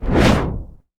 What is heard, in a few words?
cinematic impact wipe